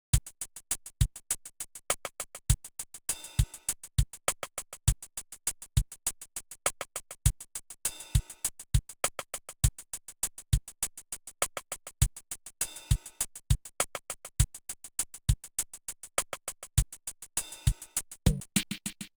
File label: Gloomy with Rhythm